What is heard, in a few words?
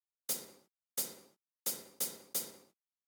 Additional Instruments; Multitrack